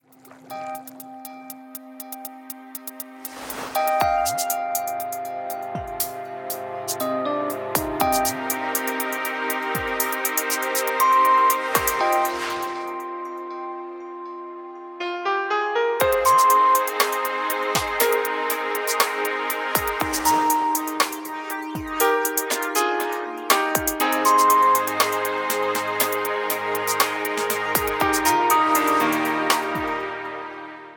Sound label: Silent Waters, ringtones; Explay A400